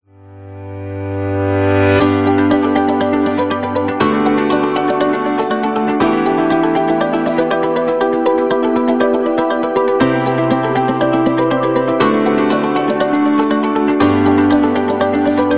Uplifting Background

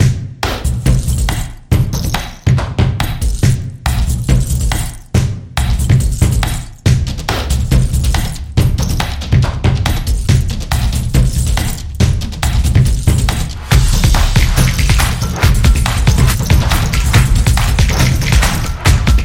The Drumming

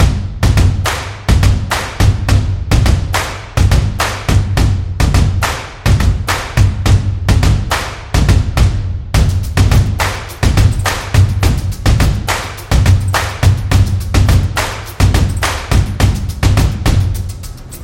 Stomps; Big Typo Stomps; stomps action

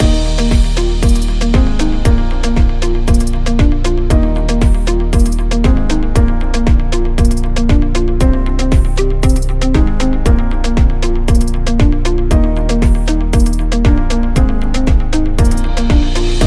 Positive Corporate Loop Upbeat

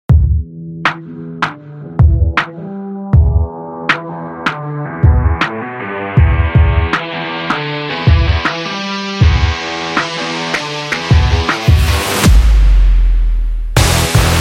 Rock Hip Hop

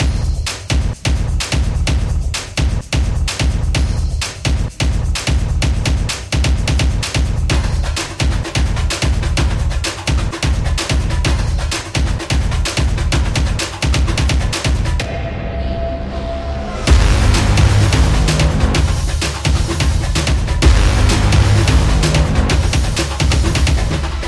Soccer Trailer, sport drums

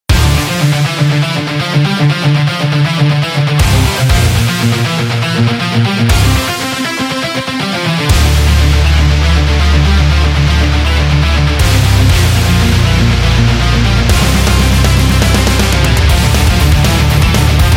A Heavy Metal

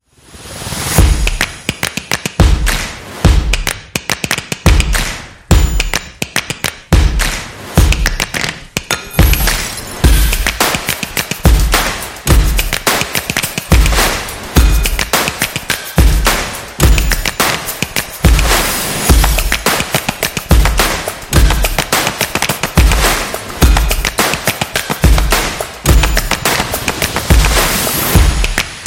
Hip Hop Style Calm Beat; calm drums

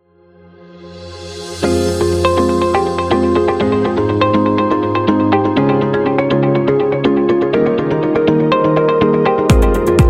Corporate Success

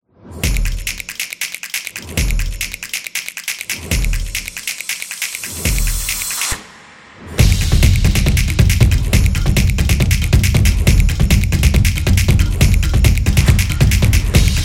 Gaming Background; sports beat

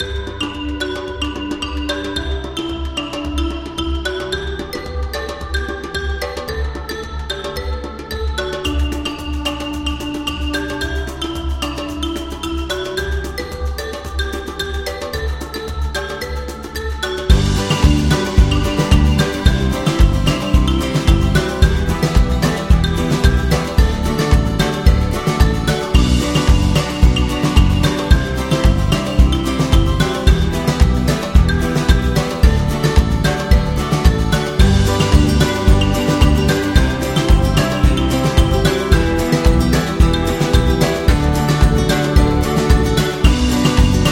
Africa Upbeat

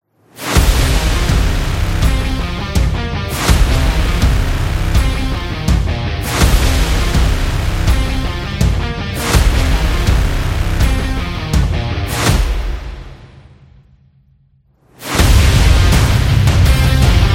Action Sport Trailer

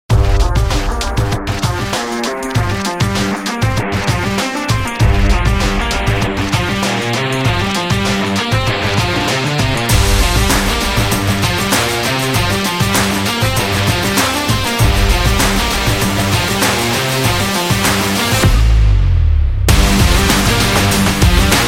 Powerful Action Stylish Rock Big Beat

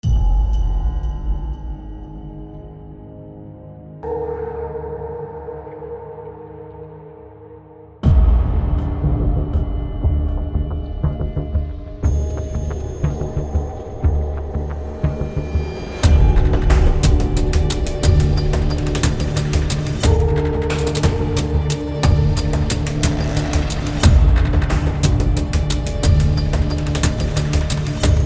Suspenseful

